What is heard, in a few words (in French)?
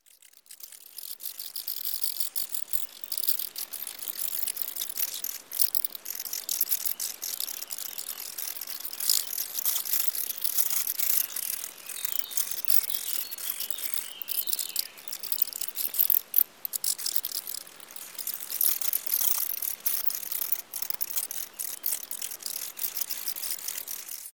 pipistrelle